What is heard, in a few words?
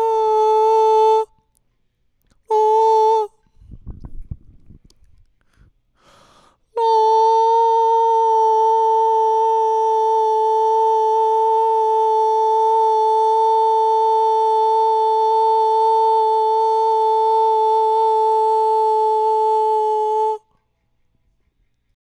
Male Ambient Lead Voc